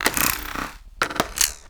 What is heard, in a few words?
alien game weapon zap gun reload glitchy sci fi impact hit; hard